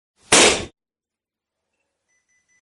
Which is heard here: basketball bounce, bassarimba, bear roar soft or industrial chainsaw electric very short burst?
basketball bounce